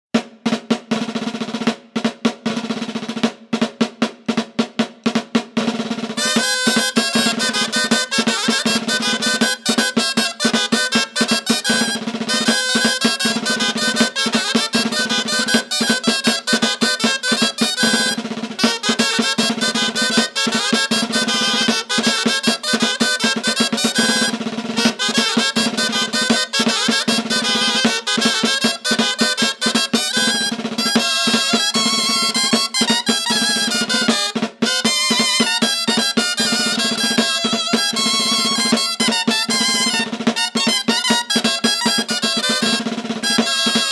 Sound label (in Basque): gaita atabala; JOKOA; Errexa Arin Arina